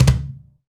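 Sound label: Cajon Lo Flam, Percussion; Roland BK-5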